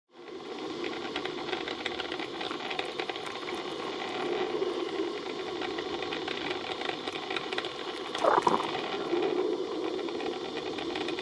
Boiling Water

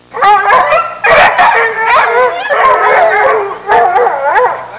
Hound kennel barking sound

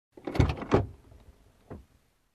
Opening Car Door

